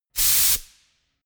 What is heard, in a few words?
pneumatic pump